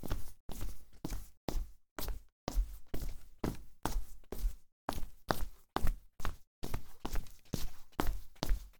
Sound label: walk slipper